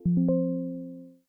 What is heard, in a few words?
windows 11 background light